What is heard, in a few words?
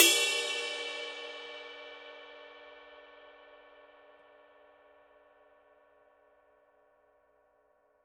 master 21 dark dry ride bell, paiste; masters